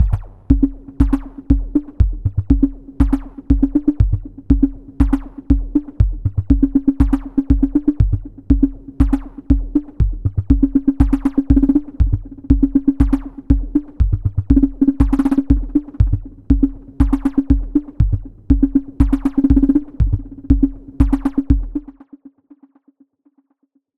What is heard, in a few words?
Dreadbox Drips V2 Delay bd